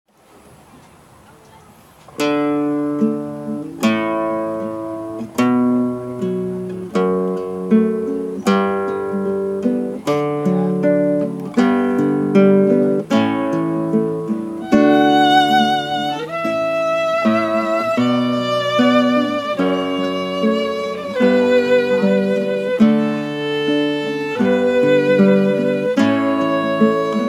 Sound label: Canon in D Guitar violin